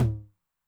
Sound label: Noise Med, 909, MID TOM